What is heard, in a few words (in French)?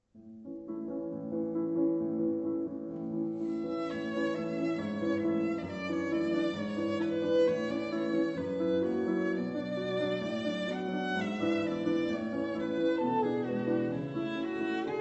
Romance violon piano; Romance, violon et piano (Paris : Heugel, 1906).